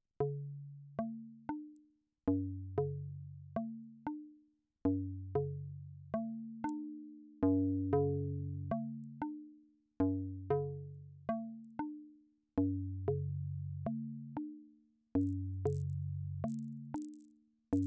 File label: Plonk; Intellijel; 1st Contact